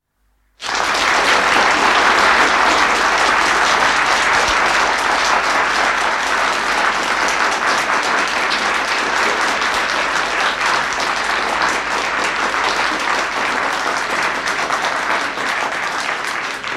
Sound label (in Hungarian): taps